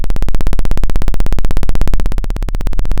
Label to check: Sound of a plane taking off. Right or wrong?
Right